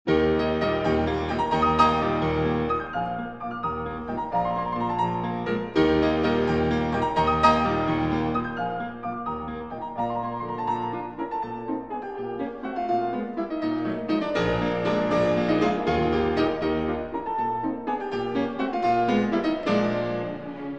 Piano Concerto No. 5 in E-Flat Major, Op. 73 III. Rondo - Allegro; Emperor